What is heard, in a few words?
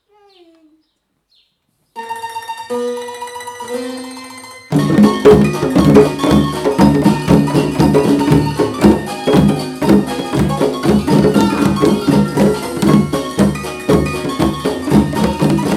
Ragtime; lvr frida kahlo schule; projektwochen 2018 fit for fun